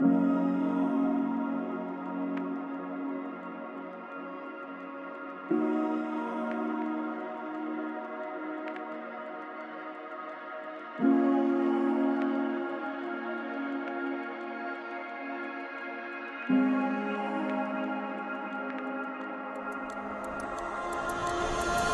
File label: BPM 175